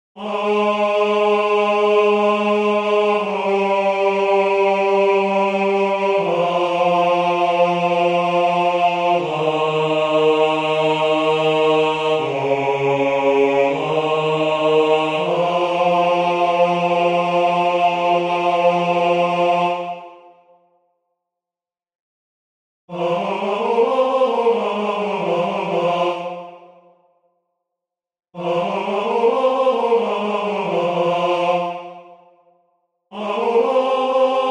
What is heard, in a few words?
tenor; Waiheke Island Choral Society